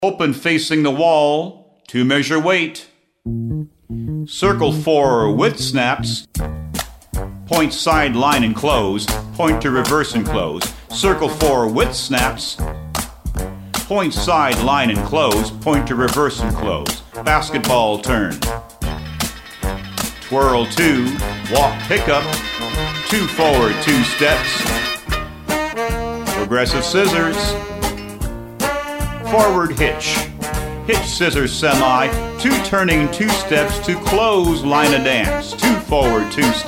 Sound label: vocal sample